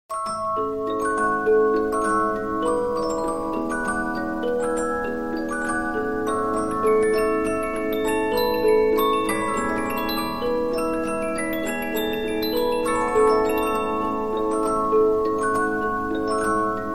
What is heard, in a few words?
Polyphon, 15inch disc musicbox